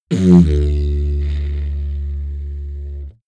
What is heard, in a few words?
didgeridoo short heavy